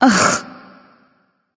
ugh; smite; pele

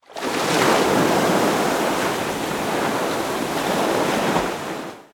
whirlpool ground; smite; poseidon

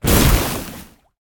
basic fire; Smite; Sol

